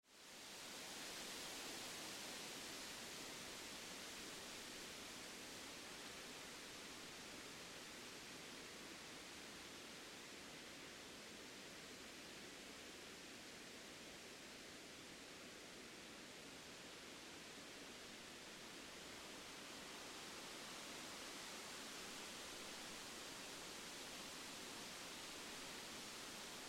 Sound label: wind